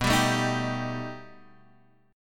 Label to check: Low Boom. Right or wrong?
Wrong